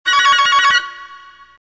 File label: ringtone, violin ringtones